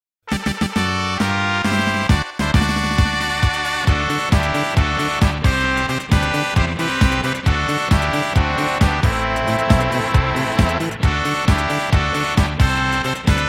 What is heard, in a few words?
Victory Fanfare; Final Fantasy VI OST